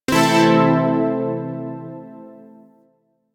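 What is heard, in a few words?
Shawzin Pentatonic Major Chord; Zariman Shawzin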